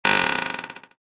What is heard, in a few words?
door; kishimi